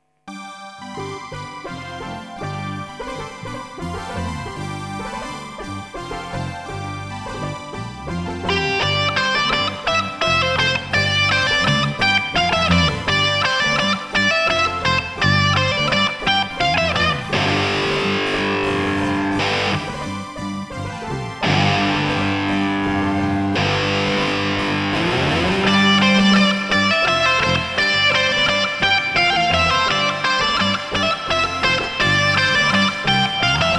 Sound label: melodie